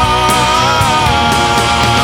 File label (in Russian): американская метал-группа